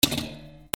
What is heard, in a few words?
GARAGE